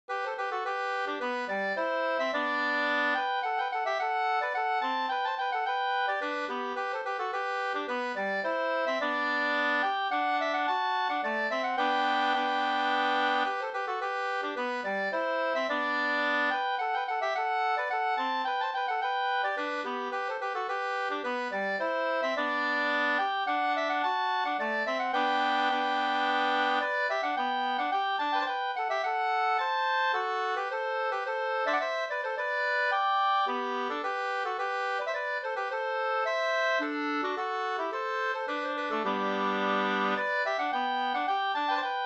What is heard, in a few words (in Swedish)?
polska Brudpolska